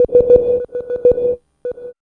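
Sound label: synth tone; E-Lab; X Static Goldmine 1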